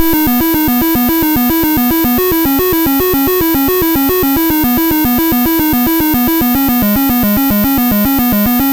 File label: Apple II Synth, Chiptune